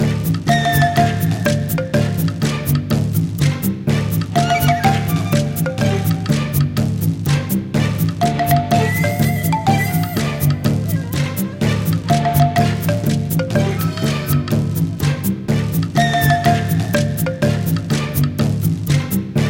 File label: Speed Up; Cooking Dash